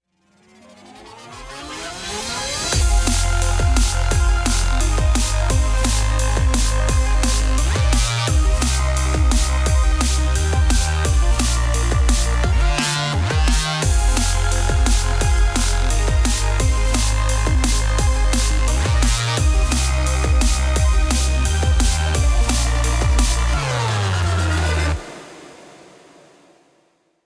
Heavy Dnb; Cyber Hook

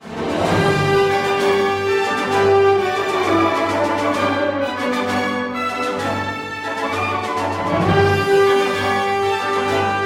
French Horn; A Heroic Quest; Motor World Car Factory; android

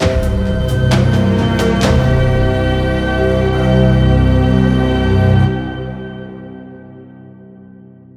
Platforming Victory; song of iron

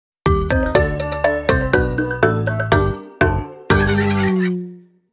Quest Fail

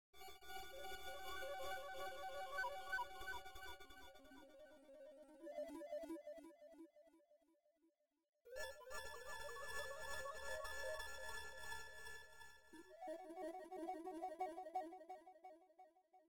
8 bit Ambient; oddplanet

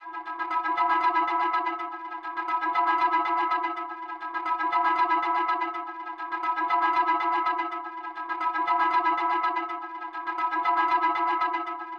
Scroll

